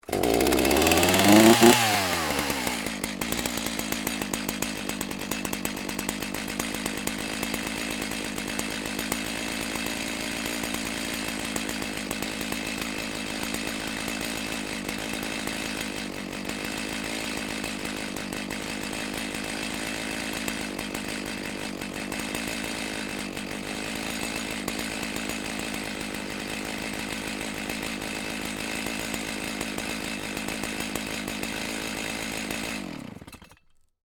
Chainsaw Run, Industry, Chainsaw, Start, Idle, Off, Stihl, Ms310; SoundEffects-BlastWave